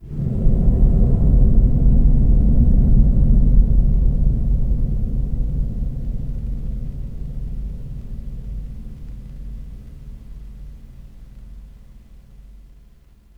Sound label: Dark Texture, Ambience; Warframe; Shadowgrapher